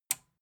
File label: metal button tiny release enable